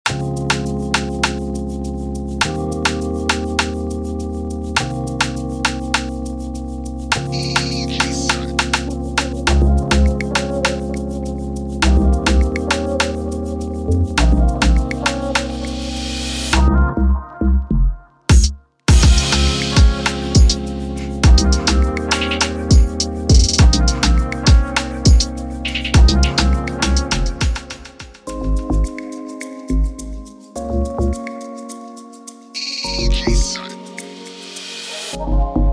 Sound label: Afrobeat